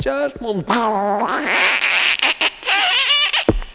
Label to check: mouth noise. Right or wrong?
Right